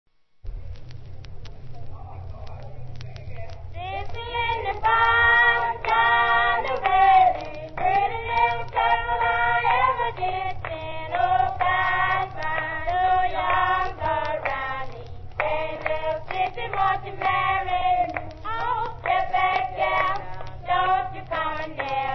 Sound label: clapping song; public domain music; 1939; sissy in de barn